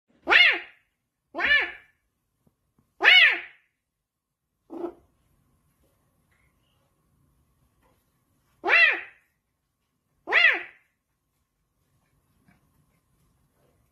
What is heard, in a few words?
Meowing; Boykisser